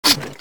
watergun shoot; csco; csgo

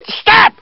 hostage pain, hos pain; csco; csgo

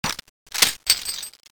clip in, weapons; csco; csgo

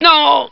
hostage pain, hos pain; csco; csgo

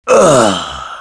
die, male; csco; csgo; zbm3

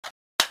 balrog5 clip in, weapons; csco; csgo

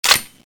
coilgun clip out; csco; csgo

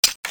m32 insert, weapons; csco; csgo

